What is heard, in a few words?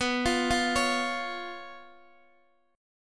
B Suspended 2nd Flat 5th down Guitar Open D